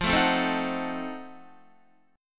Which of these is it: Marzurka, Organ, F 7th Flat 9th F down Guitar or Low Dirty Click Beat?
F 7th Flat 9th F down Guitar